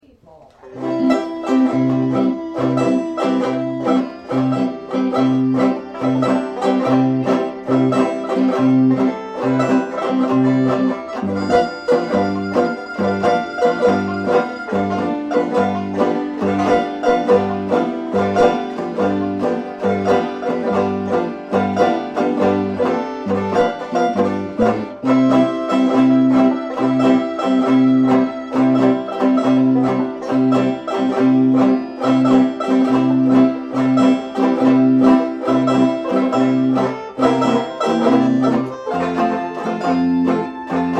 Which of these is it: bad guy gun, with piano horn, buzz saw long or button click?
with piano horn